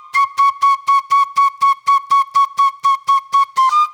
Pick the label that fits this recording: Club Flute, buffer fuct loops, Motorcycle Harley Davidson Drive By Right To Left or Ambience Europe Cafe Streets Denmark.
Club Flute